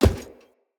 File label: Heavy Grunt Boot Walk Wood, Footsteps; Enemy; Sinecure